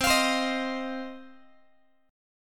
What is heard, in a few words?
C Minor Double Flat 5th C down Guitar 4A 4E 5A 5D 5G sharp 6C sharp; Cmbb5 strummed; JGuitar